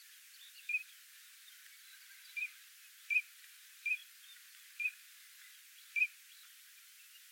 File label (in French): orn sarcelle d hiver